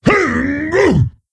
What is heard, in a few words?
Attack; King Ymiron